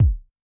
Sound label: Quick Buzz, Kick, Yamaha DTXpress-II